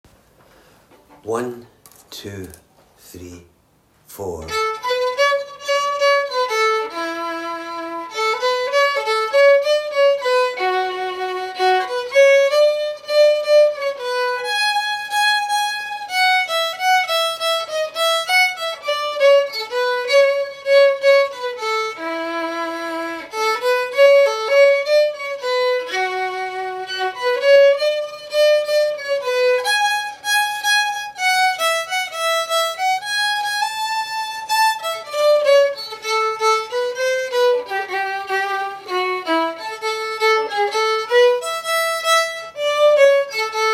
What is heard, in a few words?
Murray River Jig; GFW Slow Session; Jigs